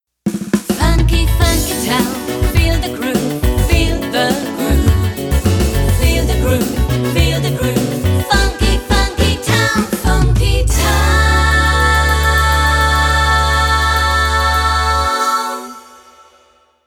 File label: Jingle Slogan 140bpm